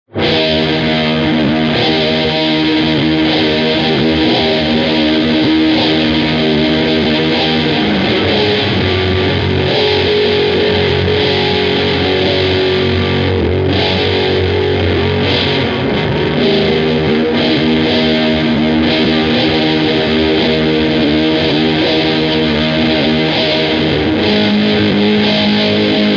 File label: strumming scoop dynamic trem; warmth dev